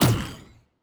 Weapon Shoot

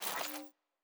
Device Start, Electric

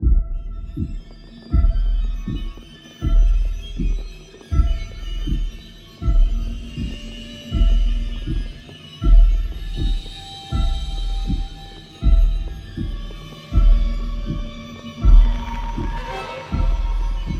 Suspense, Loop, 40bpm, Horror Sci-Fi Music; Dead Ark

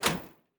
Door, Sci-Fi Sounds

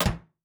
Metal Box Impact

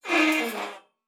Metal Foley Creak; Sci-Fi Sounds

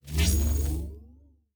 Shield Device Start, Electric; Sci-Fi Sounds

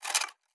Metal Tools; Sci-Fi Sounds

